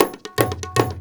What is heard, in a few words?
India, Heart of Asia